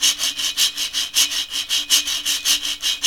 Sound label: PRC ETHNIC PERC; Roland LA